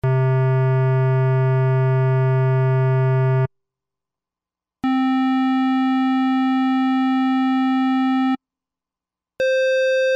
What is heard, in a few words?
Square Waveform, Roland D-50; 37 Soundtrack